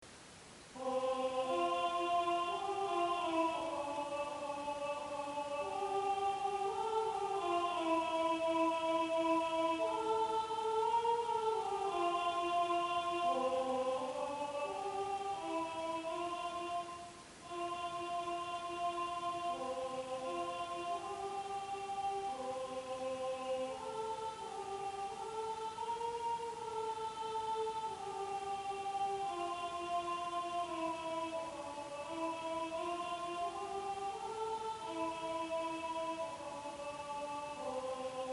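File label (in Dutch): Kerstliederen tenor en bas; sintjanskoor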